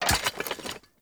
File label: Shrimp Lantern Drop Impact; Creatures; Baliq